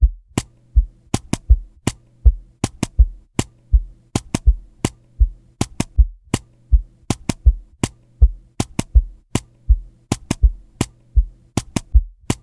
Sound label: drums